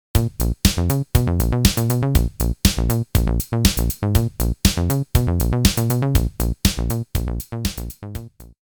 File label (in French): delay sans